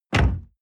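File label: sports car exterior door close medium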